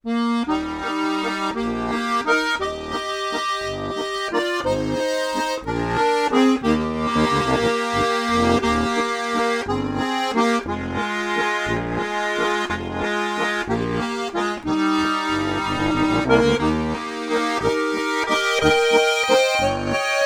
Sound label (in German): BESASDES halboffen, harmonika